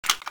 mosin insert, weapons

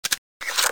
ak47 clip out, weapons

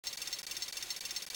idle reload, weapons

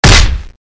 shoot end, weapons; blockas2

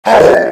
zombi chinese hurt; cso

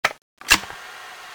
reload, weapons; blockar2

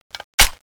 mg36 clip out; mg36xmas; weapons

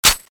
m249ex clip in

